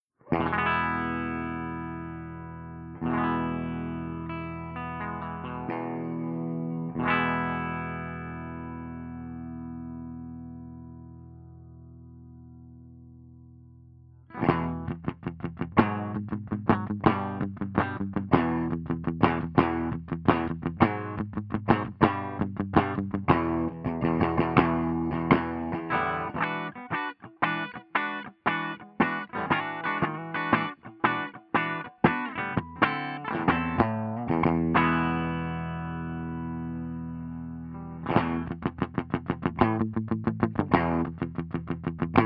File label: FENDER75 STANDARD HB